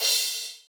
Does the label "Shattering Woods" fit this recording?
No